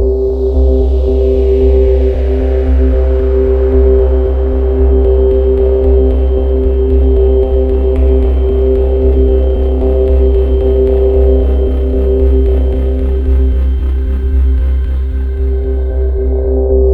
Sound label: Music Loop